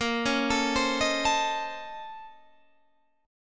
A sharp Minor Major 11th, A sharp, down, Guitar, Standard; arpeggiated